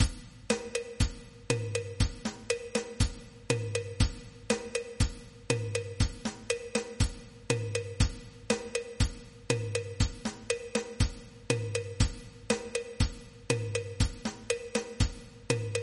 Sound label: plena, traditional rhythm; Creating Rhythms